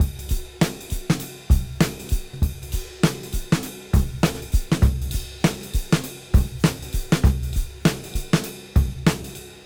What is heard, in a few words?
Cym Beat 99 BPM